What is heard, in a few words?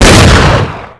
Shotgun Blast